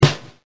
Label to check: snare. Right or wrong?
Right